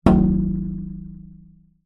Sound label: impact glass large pane resonate; zapsplat